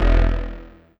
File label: Synth Hit E